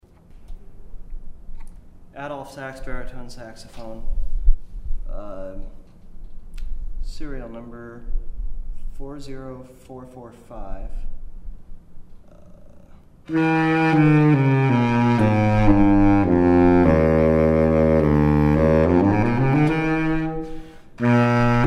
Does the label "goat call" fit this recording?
No